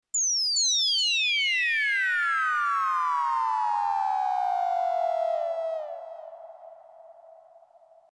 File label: FX